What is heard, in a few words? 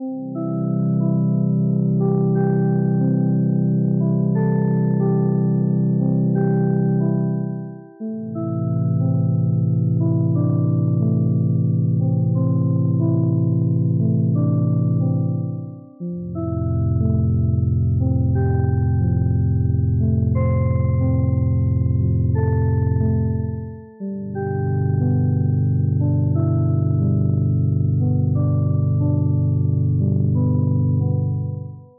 gentle creative day